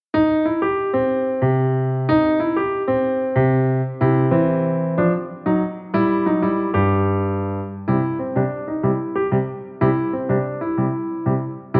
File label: Blues it